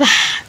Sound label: snare; 模仿久本; 纵横天下刘